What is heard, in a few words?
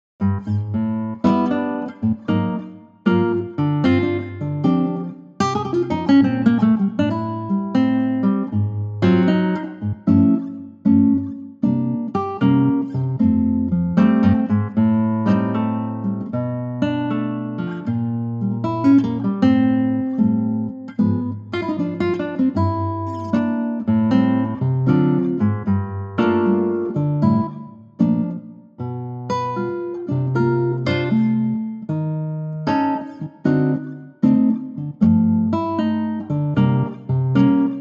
F Acoustic Guitar